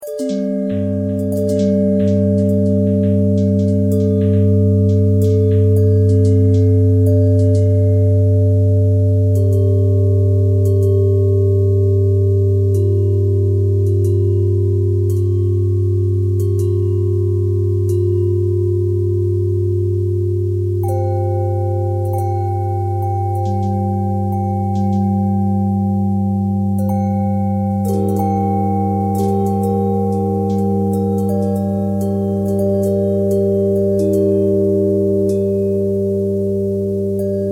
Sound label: bell bell thread pentatonic, bells